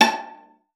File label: A5, VIOLA FINGER; Xsample VOL-5